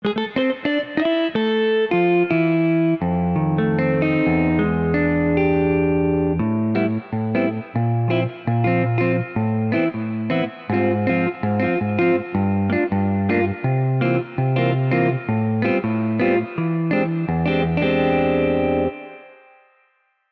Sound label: Jazz Guitar School Gym; OldSkoolVerb